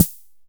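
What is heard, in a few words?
Fricke MFB-501 snare drum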